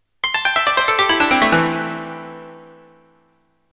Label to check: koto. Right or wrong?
Right